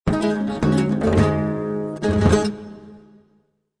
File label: Arabia Music Short; Civilization Revolution